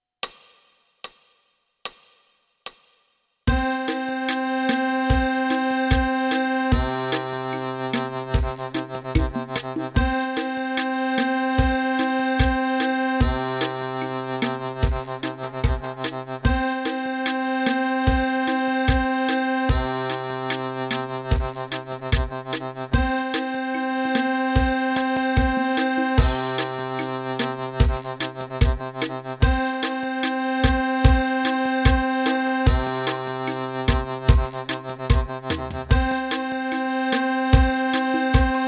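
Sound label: Semibrevi, Fisarmonica, Mano Destra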